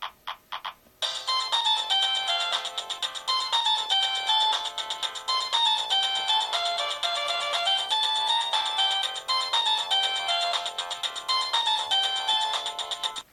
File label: samsung sghe700 ringtone